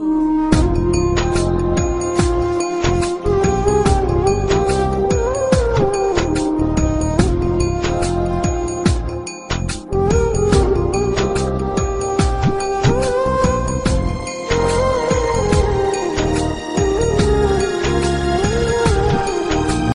Sad Nice Music

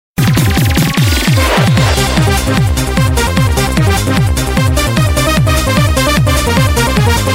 Elektro Sms

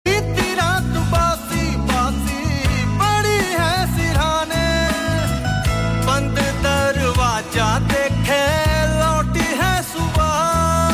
male Version

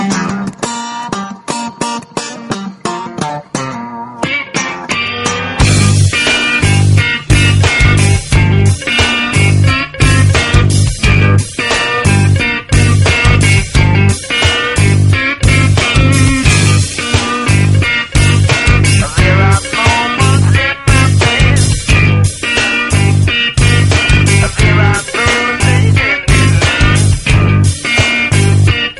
Insane Guitar

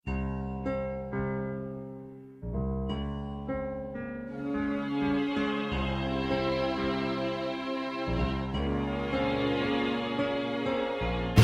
Tunisian Music

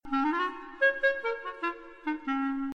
Flute SMS

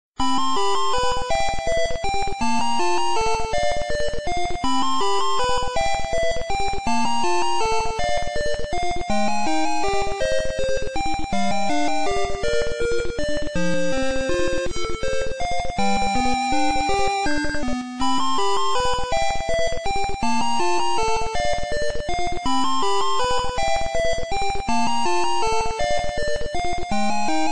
House

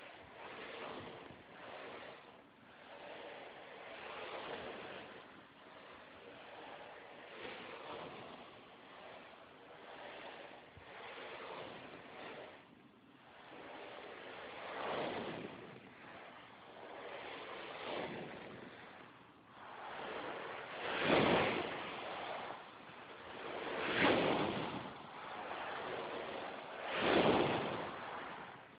Cool Waves

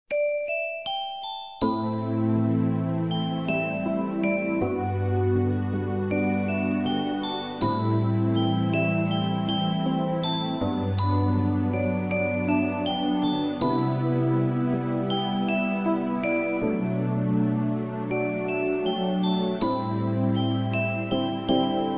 Gentle Alarm

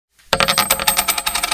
Coins Noise

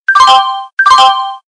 Indian Railway

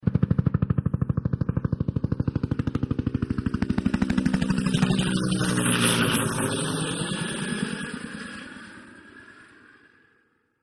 3d helicopter

